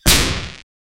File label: soft lightning strike sou